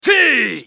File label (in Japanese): 攻撃; ナジブ